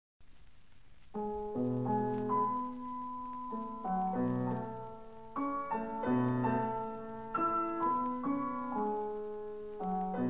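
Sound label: violin sonata; respighi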